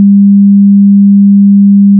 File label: sine 20 stereo